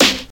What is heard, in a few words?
00s snappy snare drum sample g sharp key